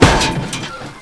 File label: light hover collide; 1997 MMX LAN PACK (win 95); Army Men